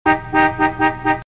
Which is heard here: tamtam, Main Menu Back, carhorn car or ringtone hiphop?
carhorn car